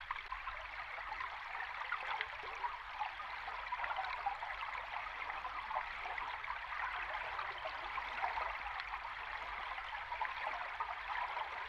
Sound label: Relaxing Stream Underwater Long